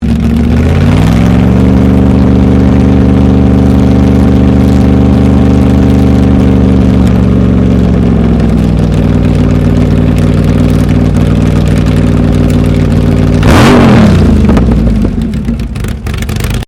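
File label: Engine Chevy C5R 7 litre Idle High Rev Close; Army recruiting vehicle; Trucks; Vehicles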